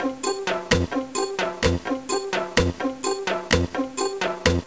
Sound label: waiting loop; Let's Discover Michigan!